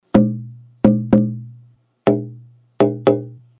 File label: Pine Body